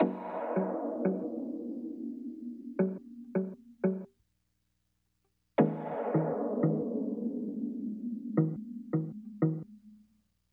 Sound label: SYN, Hip Hop and R&B Drumloops; Best Service ProSamples vol.01; AKAI